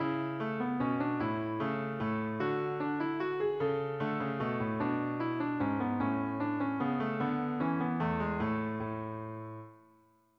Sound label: menuet